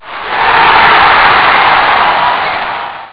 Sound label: game over; Dynamite